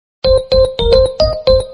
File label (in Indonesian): nada dering notification